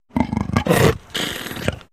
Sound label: Leopard Snarl Raspy; big cats